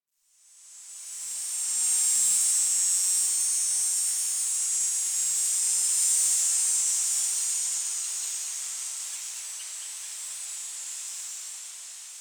Tree Cicada

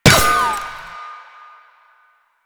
Tank Shield Impact; Enemies; Warframe; Lasrian

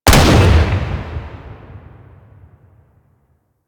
Gunblade Shot, Weapons

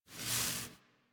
Foley Idle Head Down; Enemies; Warframe; Catbrow

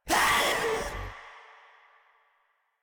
Pain; Warframe; Proto Infested Runner

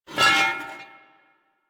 Pickup Metal; Warframe; Cephalon Weave

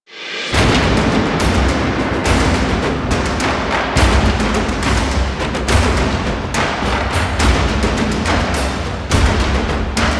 Game End You Win, Music; Warframe; DE; PVP; Lunaro

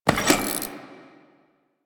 Sticky bomb Reload; Grineer; Veilbreaker; Vor

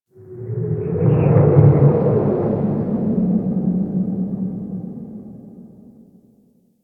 Interior Wind Howl, Ambience; Warframe; Duviri

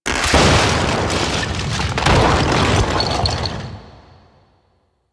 Ice Bridge Collapse B; Warframe; Corpus Ice Planet

